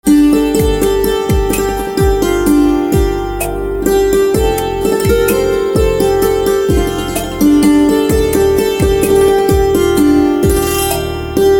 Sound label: Santoor Instrumental Ringtone